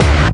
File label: Synth Bass; Vengeance Samples; VEE Synth Shots